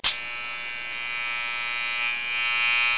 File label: ELEC SHAV; 2000 Sound Effects